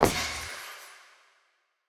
Footstep; Smite; god Izanami

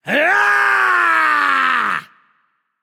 battlecry; smite; voicepack; hachiman

